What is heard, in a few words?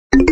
Notification; BlackBerry OS 5